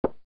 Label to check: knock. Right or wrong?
Right